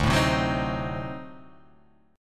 D 7th Flat 9th D down, chord

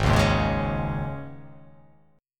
G sharp Suspended 4th chord, down; G#sus4 strummed; JGuitar

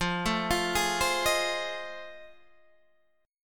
F 7th Sharp 9th, Guitar, Open G

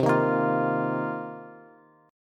C Minor 11th C Bass Bass Standard; strummed; JGuitar

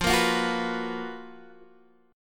Gb Major 11th

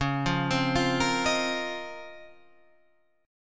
Db Major 13th, Guitar Half Step Down

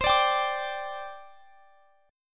C Suspended 2nd, C, down, chord; Csus2; strummed

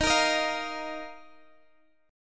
D sharp Suspended 2nd D sharp, Guitar Open F; strummed; x,22,22,22,20,x; chord

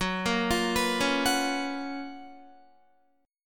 Gb Major 11th Guitar; GbM11 arpeggiated; JGuitar

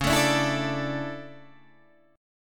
A Suspended 4th, C sharp

